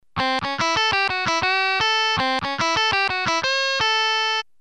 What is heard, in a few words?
lick